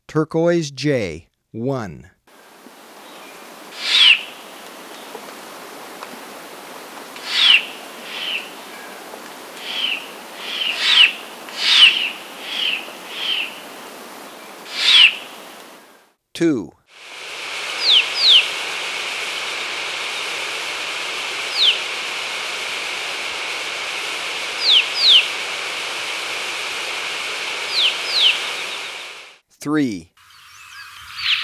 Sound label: Turquoise Jay, Birds of Ecuador; S. America